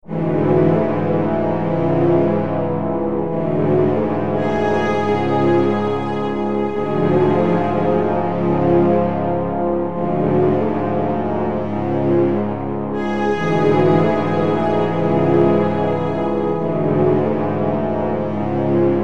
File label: trombone end